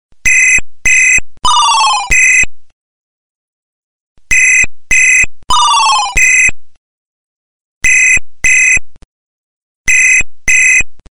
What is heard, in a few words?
old phone ring